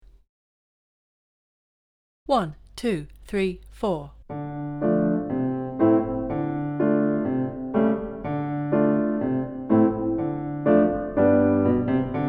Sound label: D Minor Reel backing